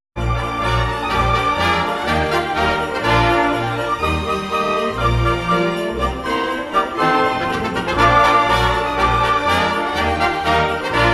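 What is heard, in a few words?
JINGLE BELLS ORCHESTRA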